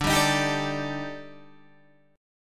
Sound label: Eb Major 7th Flat 5th D